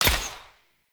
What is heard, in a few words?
Hunter Bow Shot, bows; Weapons; Enemy